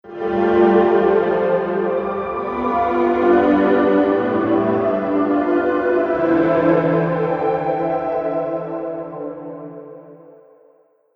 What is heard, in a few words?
Dissonant Interlude; Musical Sound Effects